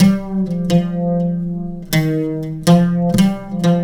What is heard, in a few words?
SITAR ETHNO 125; eJay; Guitar